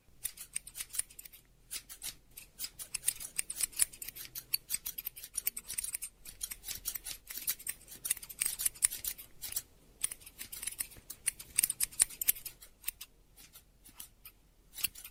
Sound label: Hair Cutting Sound, barber